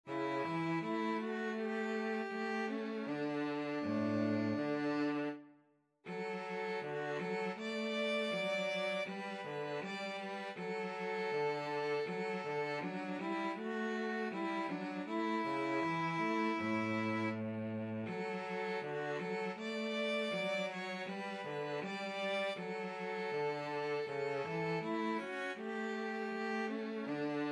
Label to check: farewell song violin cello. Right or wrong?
Right